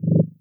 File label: Menu Dropdown; Custom UI Sounds; Call of Duty Ghosts